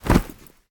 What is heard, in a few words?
minion bodyfall; smite; ahpuch skin10